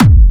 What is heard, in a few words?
Clubby Kicks, Bassdrums